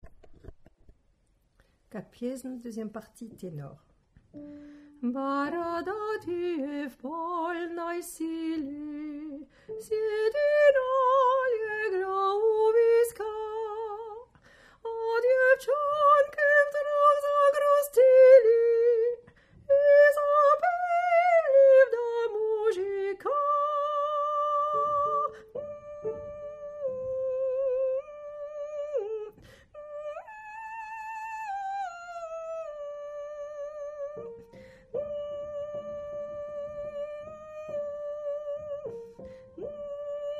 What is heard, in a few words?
Tenor